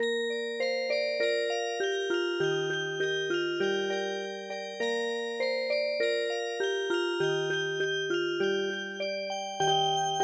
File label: kokiriko bushi Music Box